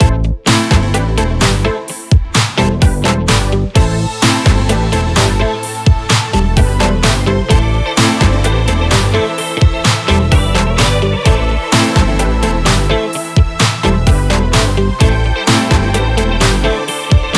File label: Pop Arcade